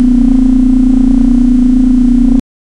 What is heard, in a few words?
realistic low rp, low rpm